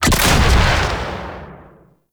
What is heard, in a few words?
RPG Shot; Warframe; Grineer; Hellion Elite